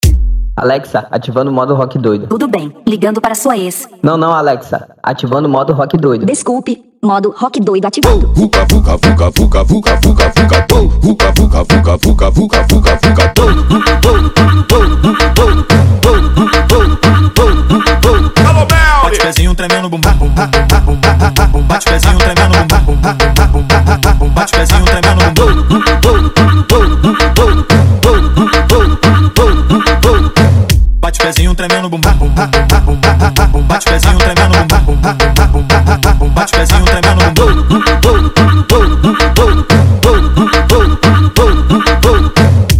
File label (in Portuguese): LOOP ALEXA ATIVANDO MODO ROCK DOIDO; Tecnofunk